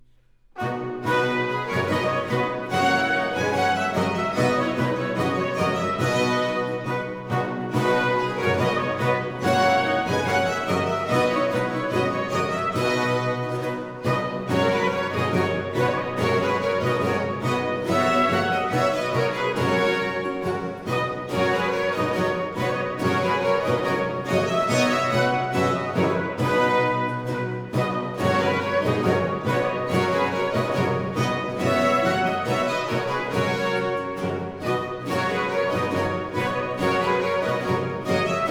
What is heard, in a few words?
Trumpet Tune; Baroque; Purcell; Highlights Opern